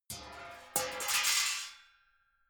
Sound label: metal sheet crash hard long; metall